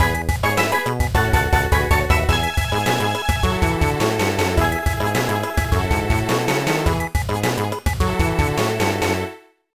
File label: Fanfare; tetris ds